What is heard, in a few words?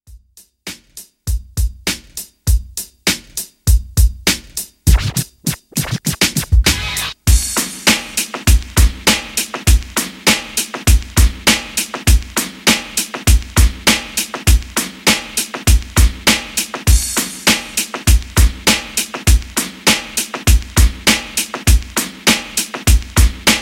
Old School Redrum 100Bpm